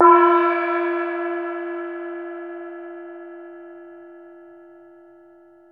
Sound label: CHINESE, Asian Cymbals